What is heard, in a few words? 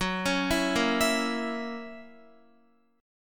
Gb 7th Gb down Guitar, chord; arpeggiated; JGuitar